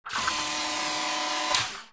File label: door